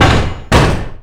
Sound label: Enchant Hammer Good